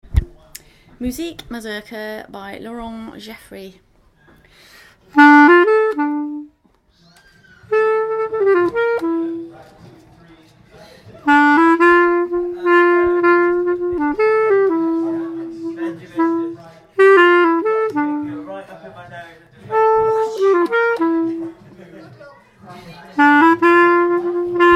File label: Mazurkas; Muzique Mazurka